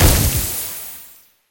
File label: Bomb Bomb; In Merged Bomb Bomb